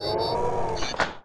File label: minigun draw; weapons; Dystopia